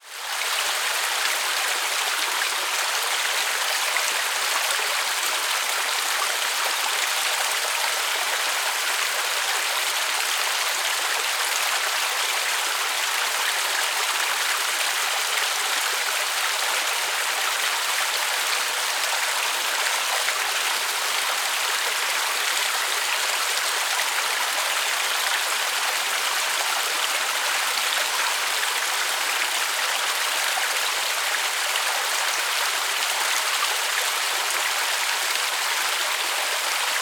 nature creek forest shallow birds buderim forest qld australia